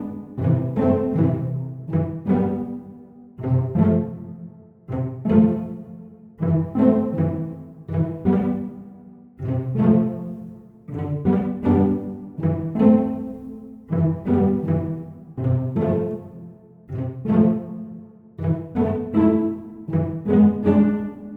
cello pizz; пиццикато

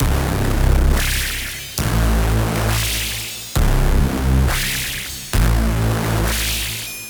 Fracture, dubstep synth

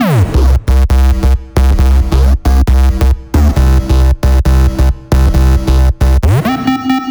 Groove, dubstep bass; бас

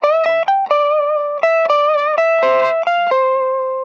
Guit Solo; гитара

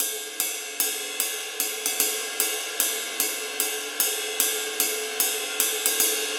Ride Cymbals, регги

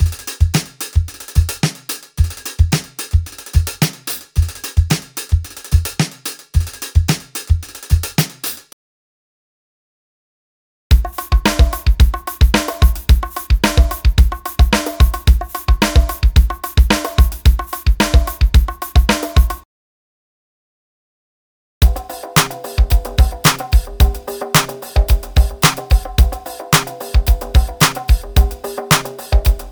Disco Rock Drums 110 BPM; Ударные (Drum Loops)

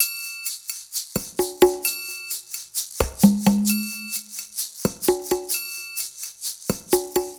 Bossa perc A, латино

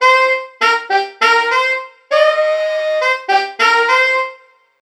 Sax On the Beach, духовой сэмпл в стиле funk

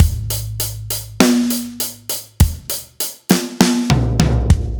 Drums, метал

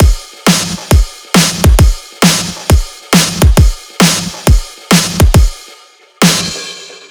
Fragile Kit B, 135 bpm

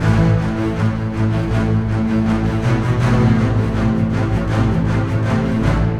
Strings Ens stacc; Струнные инструменты (Movie Score); стаккато; film music; Strings Ensemble (стаккато); 80BPM; Музыка для фильмов

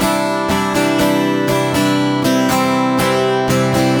Acoustic B; акустика

